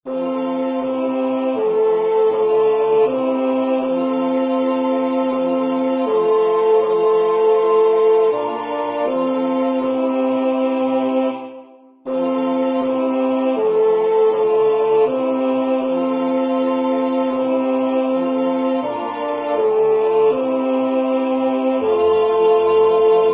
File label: Ave Maria Tenor; Arcadelt